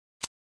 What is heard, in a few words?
scissors